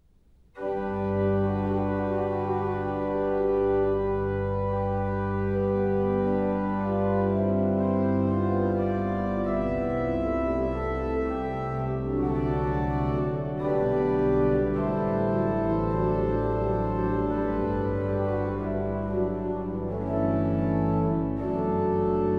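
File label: Prelude, organ; J. S. Bach; Piet Kee Plays Bach, Volume 3; Eight (short) Preludes and Fuges; No. 5 in G major, BWV 557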